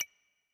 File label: item click